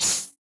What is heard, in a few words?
snake attack